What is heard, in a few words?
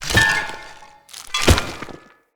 Foley Death; Enemy; Characters; Corrupted Sproutfolk